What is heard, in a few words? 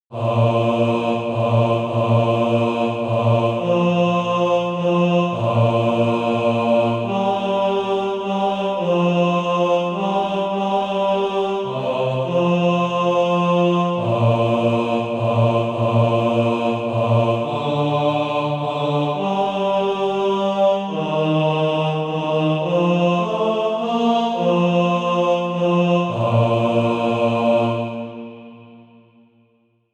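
bass, Practise Music; Father in Thy Presence Now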